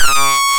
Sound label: FIX SYNC, ARP Synths